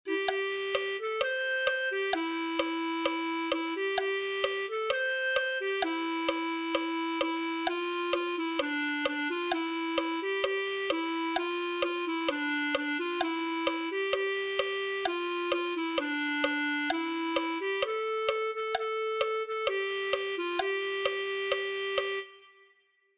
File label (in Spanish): clarinete